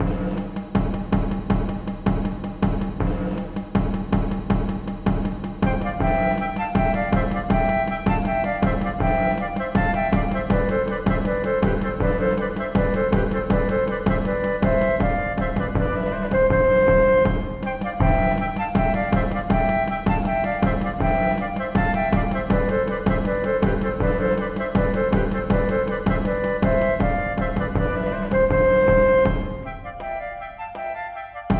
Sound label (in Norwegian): Marchs for fløyter og trommer; Bang